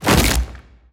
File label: bighorn small hole crack